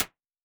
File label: Living Room, Impulses